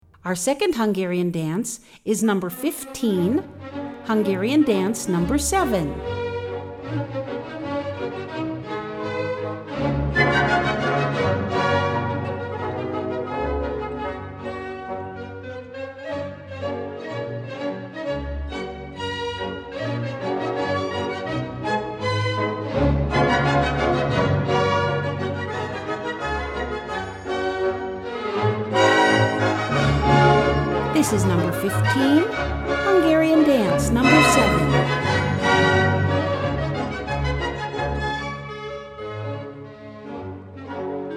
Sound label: hungarian dance 5, classical